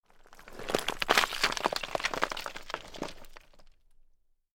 Rubble Fall

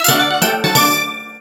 Coin Battle Win; gamerip; New Super Mario Bros. U Deluxe

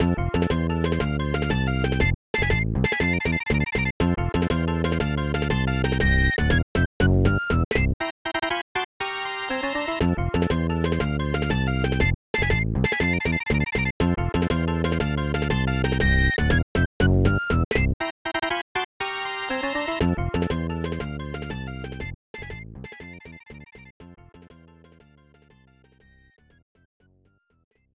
Victory and Game Over; Bubsy II; SNES

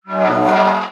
random kitchen

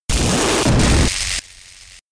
Zap Cannon

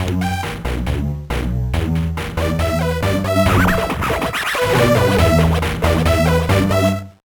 Bathroom Jam

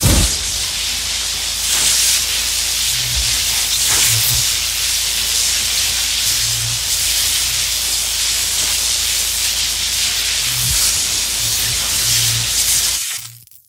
Enrage Electricity; Devour; devour 2021 gamerip pc macos

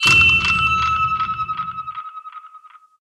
SPELLS Leech

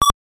Clay Shooting Whistle; Duck Hunt

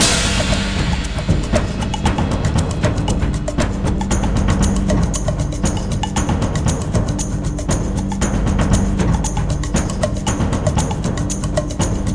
Drum Loop; gamerip; stronghold

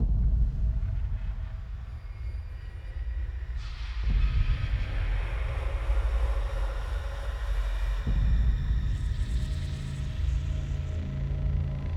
Enrage Drone; Devour; The Town

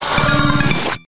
Pit Teleport; A Robot Named Fight

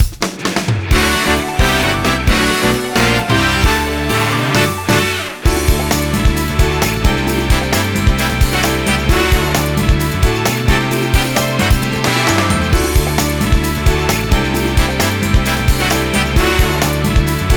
Jingle Joy (Placed Well); Super Monkey Ball Banana Blitz; Jingle Yorokobi

